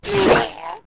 Ganondorf Hit Arrow

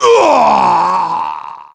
Smashed Distant; Ganondorf smashed into the distance

